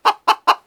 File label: chicken idle, creatures; Counter Strike Model Pack; CS:GO